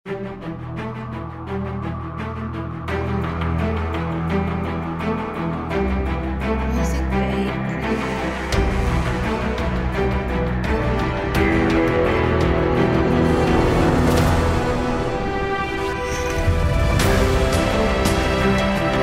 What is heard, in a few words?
Trailer Epic Hope